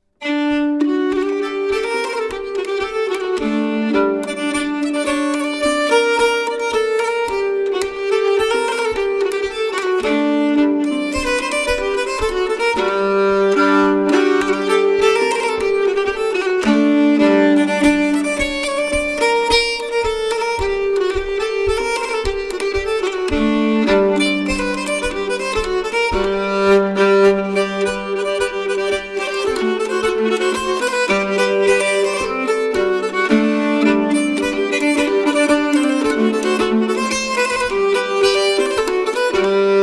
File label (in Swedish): Polska; autumn Festival 2012; Polska efter Futteri Petter